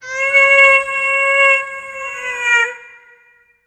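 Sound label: ancient eagle cry high pitched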